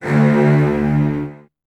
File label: D1, Virtuoso Strings, 16TH; ILIO; Kirk Hunter; CL 16TH